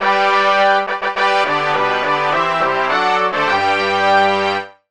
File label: Fanfare MIDI